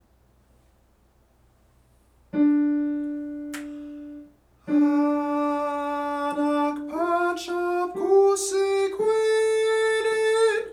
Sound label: soprano